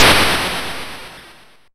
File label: Snare, YM, CHIPSHOP HITS DRUMS; ChipShop Deluxe